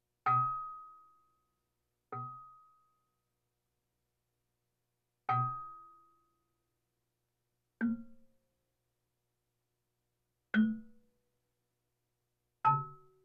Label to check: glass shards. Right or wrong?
Wrong